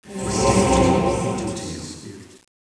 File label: Lethal Aura Sound